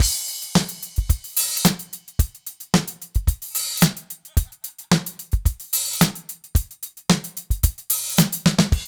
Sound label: DRUMS; East West; Funky Ass Loops